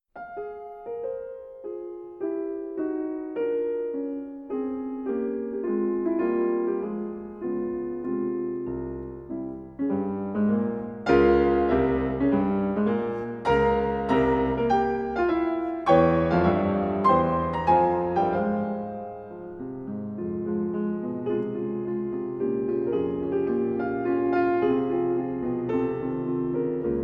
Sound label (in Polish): Chopin koncerty single piano